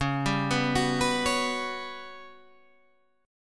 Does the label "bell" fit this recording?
No